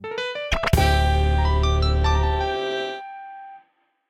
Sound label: transition To Bonus; scruffy duck